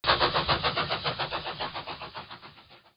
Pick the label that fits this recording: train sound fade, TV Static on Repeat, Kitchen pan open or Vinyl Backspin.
train sound fade